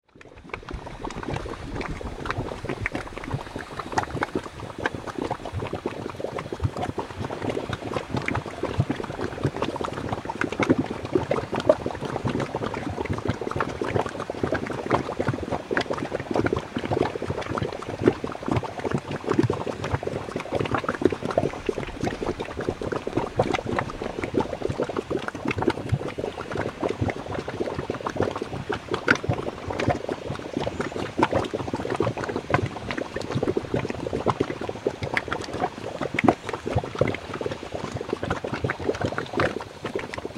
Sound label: clear lake mudpot; s rim